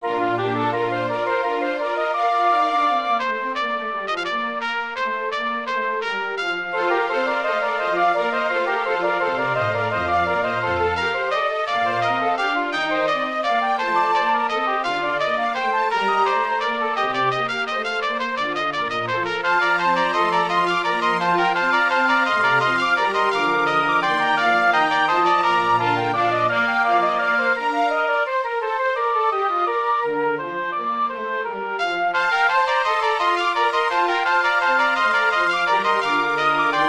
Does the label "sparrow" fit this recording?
No